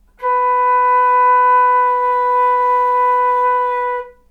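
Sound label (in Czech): fletna d dur, flute D major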